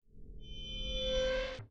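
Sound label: sting, synth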